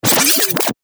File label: BREAKER SCRATCH